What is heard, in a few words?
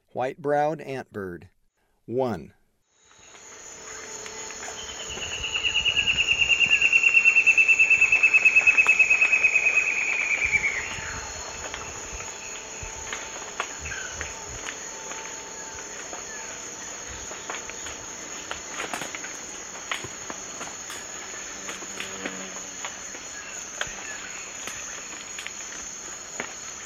White-browed Antbird; S. America; Eastern Lowlands